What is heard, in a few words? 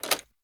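gear rattle weapon large; viper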